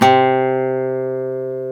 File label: GTR Nylon, Roland; Club-50 - Foundations Roland; Nylon Martin